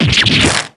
damage

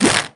confident; auchi

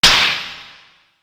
smack; ryu

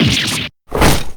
damage; hugh

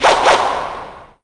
punch punch

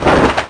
coat

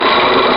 grinding

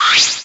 evidence

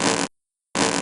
tug; Uzukumaru

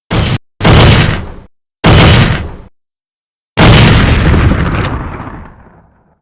dynamic entry

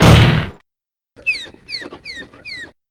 get up; p4u; Ken and Koromaru

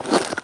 goulloyne

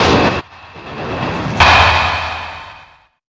logic connection

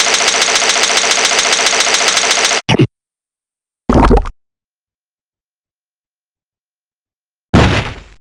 breakdown; portsman

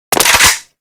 arm

